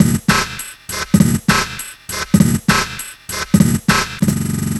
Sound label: Drill Beat, Electronic Beats; Sonic Foundry (Sony Creative Software); Myths of Technology